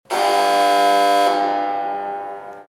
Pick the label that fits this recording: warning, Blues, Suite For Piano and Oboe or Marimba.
warning